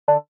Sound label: Futuristic button